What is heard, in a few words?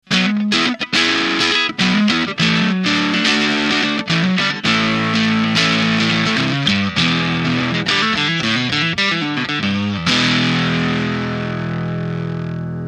Boost Drive Full Fulldrive